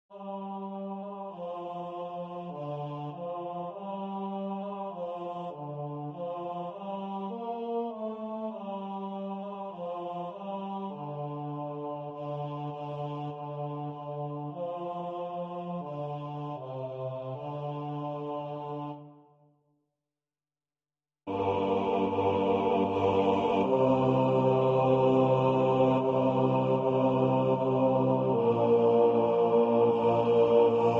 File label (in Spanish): gradual, bajo